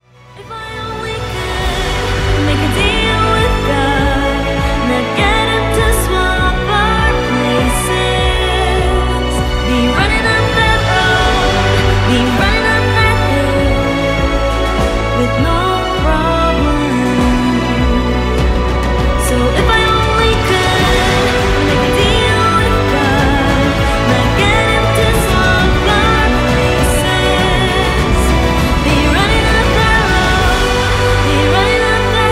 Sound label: EPIC ORCHESTRAL VERSION; Stranger Things S4